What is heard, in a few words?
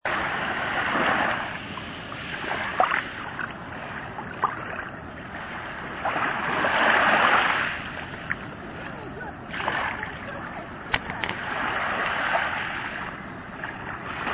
indonesia sounds; geopoesia